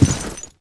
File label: jump carpet